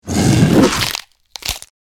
Werewolf bite flesh attack

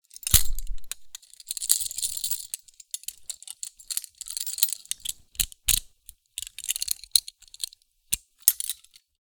Prisoner handcuffs movement handling rattle

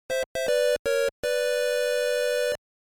Retro 16 bit or 8 bit success melody win victory

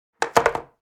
Wood hairbrush set down

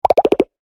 Line graph decrease animation infographics

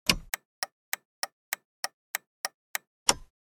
Car interior turn signal on and off lever

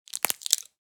Foil blister pack pressing tablet or pill out; medicine

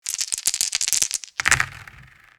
Board game map dice toss and roll; leisure

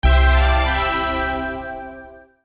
Casino slot machine small reward

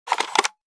Cassette deck player portable remove tape

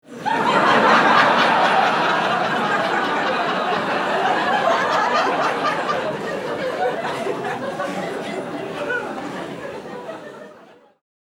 Sitcom audience canned laughter; crowd

